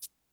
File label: Electro shake; Reference Beat